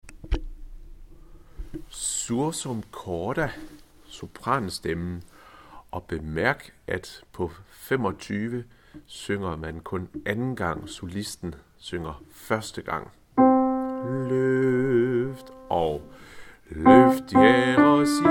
sopran; Sursum Corda; harlev koret

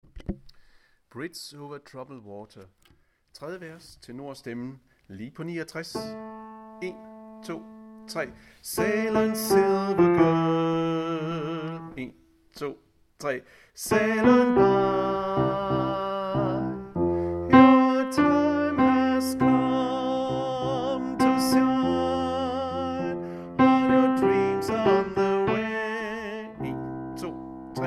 tenor

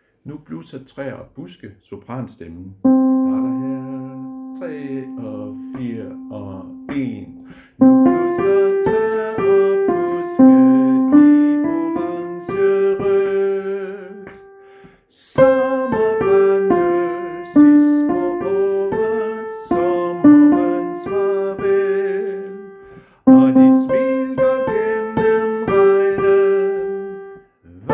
sopran; harlev koret

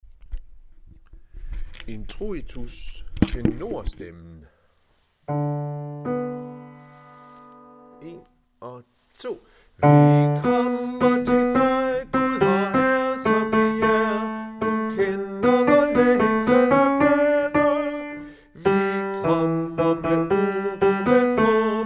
Introitus tenor, koret; harlev koret

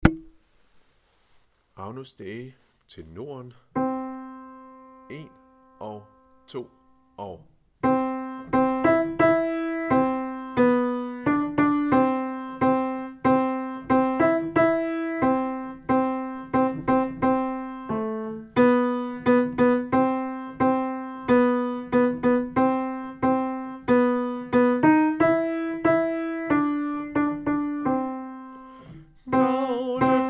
Agnus dei tenor; harlev koret

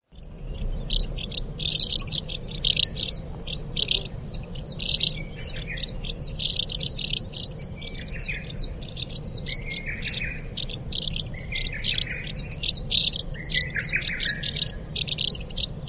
Delichon dasypus; 東方毛腳燕; Call; 日本; 似捲舌摩擦音的prret聲，常成群形成吵雜的聲音; dasypus 指名亞種; Teruo Furushima, Wild Bird Songs in Japan

